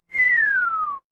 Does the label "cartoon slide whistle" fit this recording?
Yes